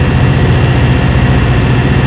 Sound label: train engine loop; SpecOps2 MPlayer Demo